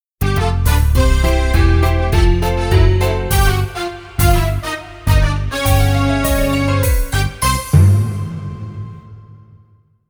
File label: Exit Accompaniment; Shin Megami Tensei - Devil Summoner Soul Hackers